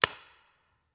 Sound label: Paintball Gun; Sound Ideas; Guns